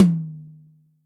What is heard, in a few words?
TOM, Acoustic Tom; Yamaha DTXpress